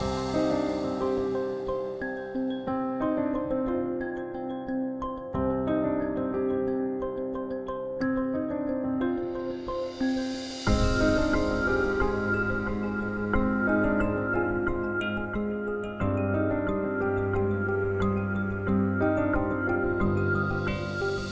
Mood Farewell Medium

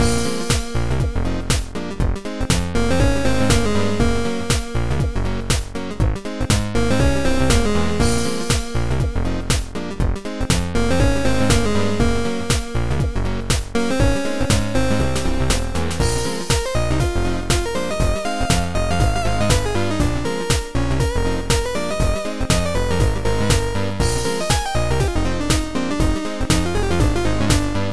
Overworld Music; You Must Build a Boat; Android

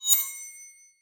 games bell

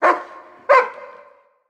Dog Chase Target; Prison Architect

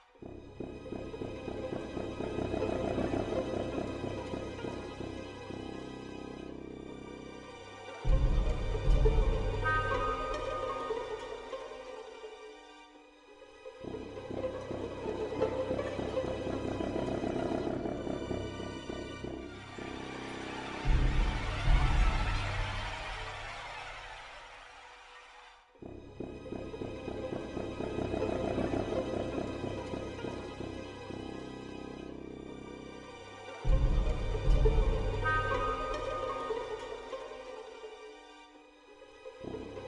Chamber of Locusts

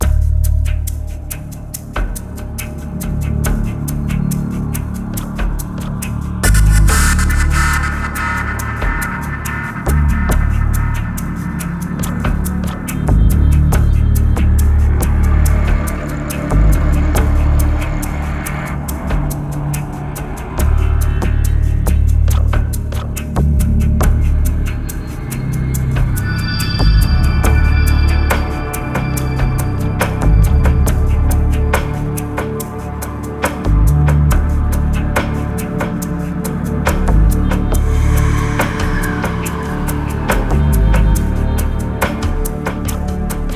Tension Loop Alerted; Zurich Tension Loop 1 Dyn Alerted

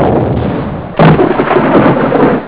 Thunder; Last Half of Darkness

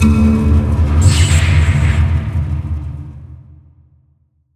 Seal Electricity; Cutscene; Time Mysteries The Ancient Spectres

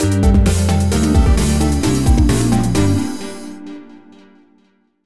Minigame Failure; Gremlins Gizmo; wii

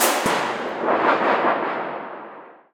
Hi Sheet Roll; Torchlight III; PS4

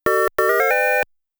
Challenging Stage (Start); Galaga

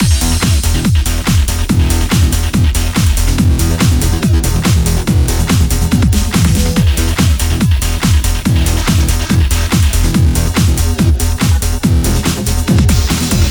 In Game Loop; Move or Die; ps4

